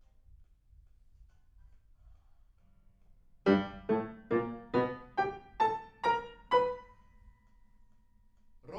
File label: PIANO